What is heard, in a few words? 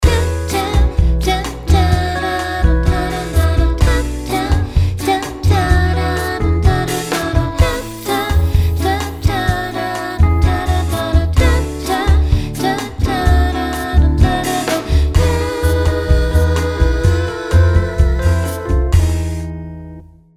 bossa